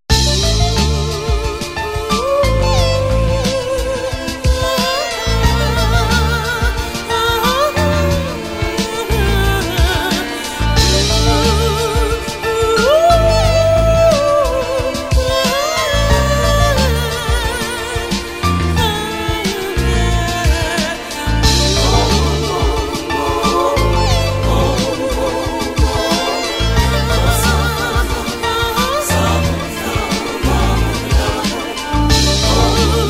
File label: BPM Om